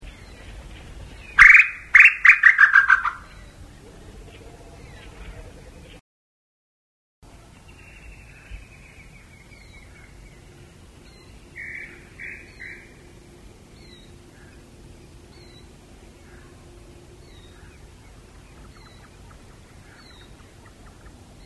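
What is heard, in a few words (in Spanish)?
Tataupá Común, Crypturellus tataupa, Tataupa Tinamou; cantos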